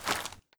draw, weapons; garrysmod; deagle cstm

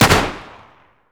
1911 fire; garrysmod

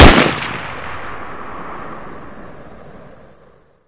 ak47 unsilenced; weapons; garrysmod

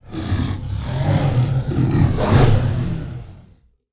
dragon; cstrike; war3source

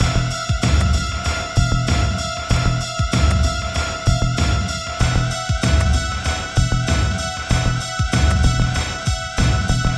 beat; garrysmod

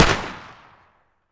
mp5 fire; weapons; garrysmod

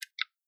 m4 forward assist, weapons; m4a1; garrysmod

